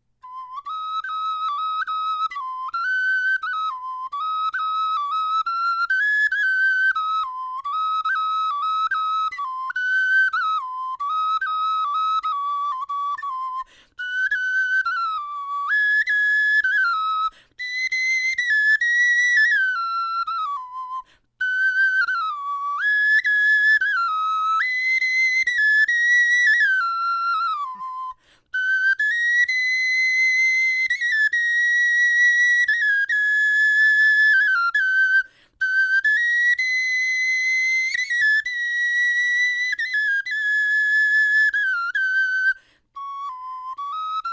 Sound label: melodie, knochenfloeten